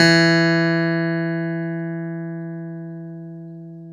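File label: clavinet; Roland LCDP10 Keys of the 60s and 70s 2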